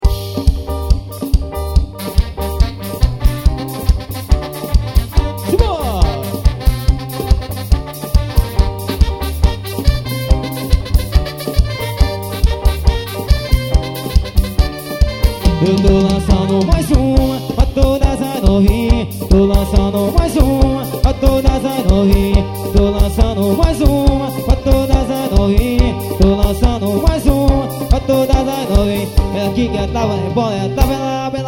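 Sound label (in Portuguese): carimbó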